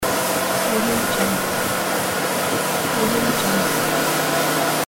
floor cleaning machine loop

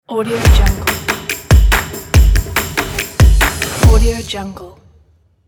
rhythm logo

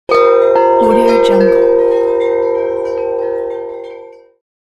bells

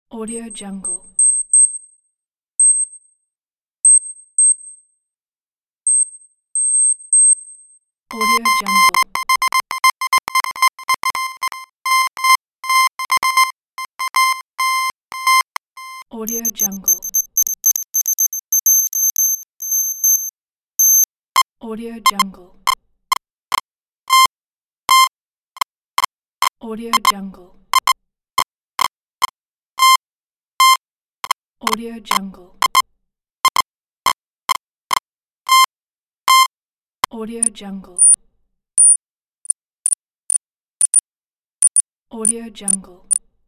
data transmission

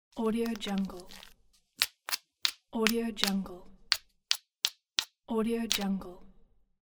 flint stone scrape

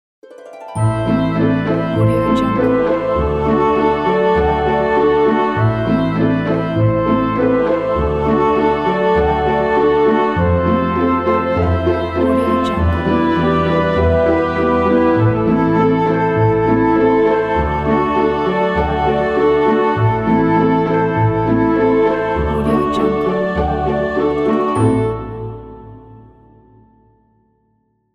medieval lyric ident

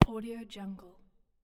outdoor heavy footstep on concrete

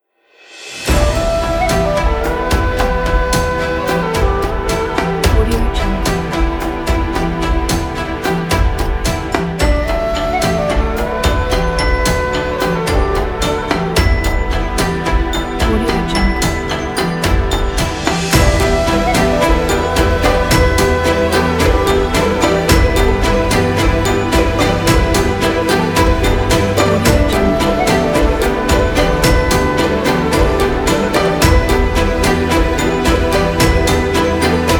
epic chinese

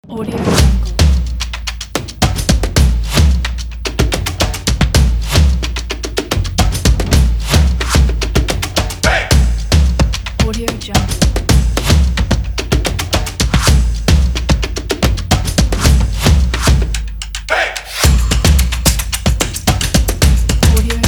stomps

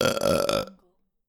male burp

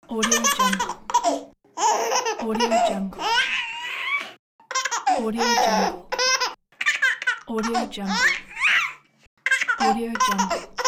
laughing baby

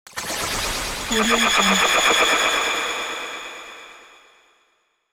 zapping scifi delay

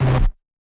distorto bits

